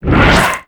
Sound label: Rupture Cast; Warframe; Infestation